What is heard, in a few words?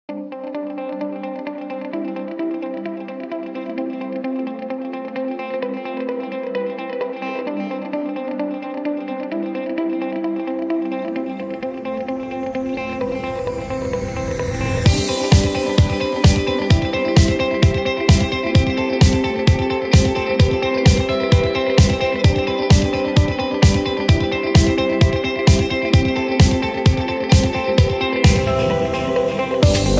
Synthesizer